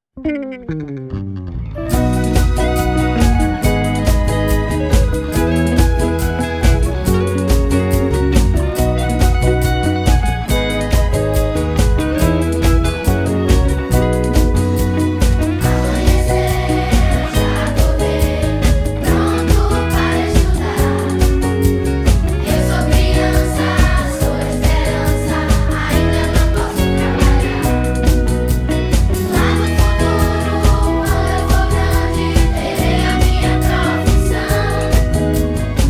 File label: jingle; fundacao telefonica; promenino; edanossaconta2014